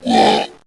Familiar DefendSound

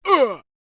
Hurt Sound, Bounty Hunter; Heroes3

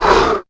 Couatl Hurt Sound

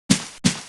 Lizard Warrior Move Sound; Heroes3

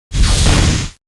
Enchanter Shoot Sound; Heroes3

